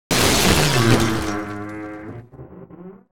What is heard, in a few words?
fire blast; soundscrate